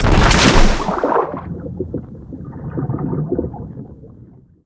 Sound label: player plunge front; Call of Duty Modern Warfare